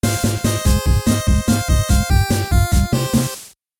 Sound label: Stinger Death; Soccerdie Cosmic Cup